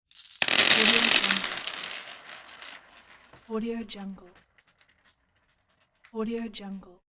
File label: marbles spill roll on wood floor; domestic sounds